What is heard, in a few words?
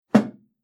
Single Dramatic Hit, impact